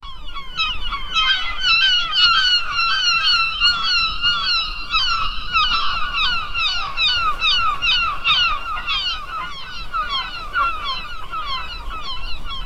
seagull with echo startin

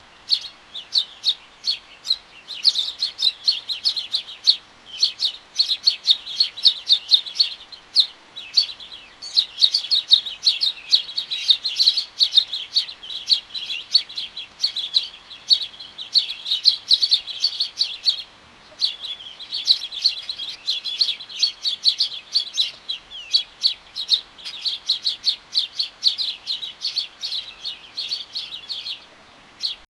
birds chirping with break

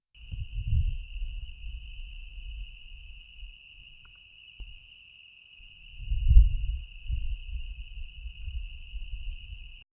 jungle background with deer

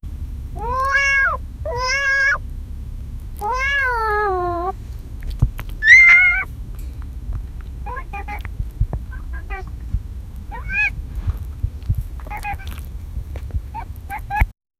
cute duck and cute cat

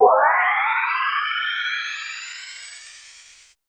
an animation or motion gr, motion graphics

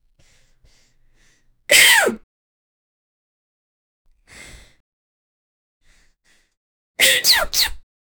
sneeze

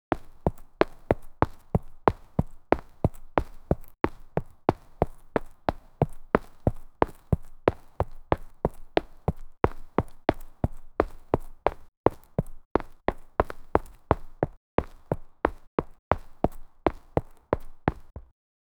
realistic sound, jogging

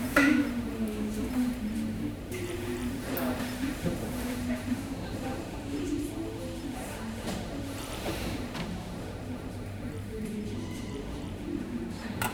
quiet coffee shop, cafe; london

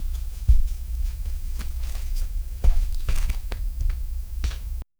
low room tone paper moveme

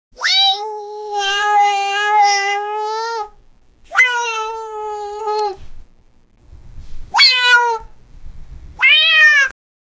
meow startled cat; comedy

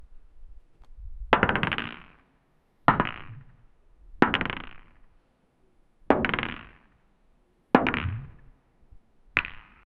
sound of colliding two po, pool

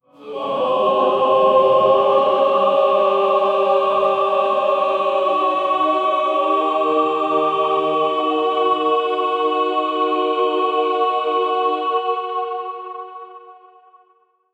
demonic, sound-effects

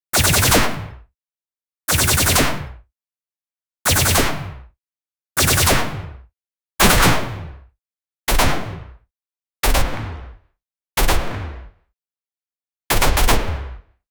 high energy sound, combat

